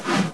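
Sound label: crowbar miss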